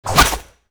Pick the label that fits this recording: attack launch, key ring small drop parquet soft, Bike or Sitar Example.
attack launch